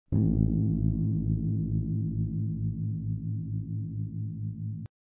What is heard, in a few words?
Out of Tune; how to tune a bass guitar